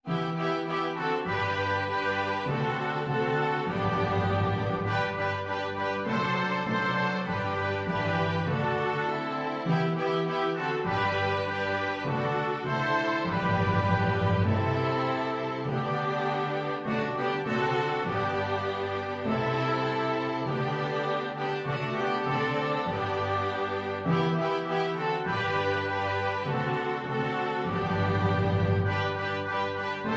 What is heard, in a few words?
flexible mixed ensemble, 4 players